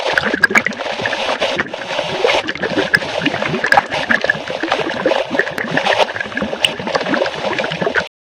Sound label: Radio Bubbles Loop Stereo; Soundproof Walls 2.0